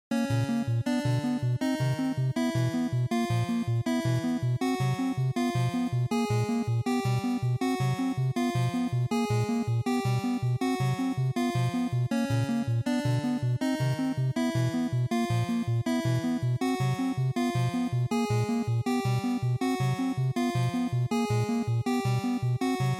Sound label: NES RPG Escape